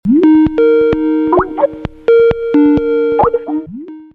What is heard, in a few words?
Skype Ringtone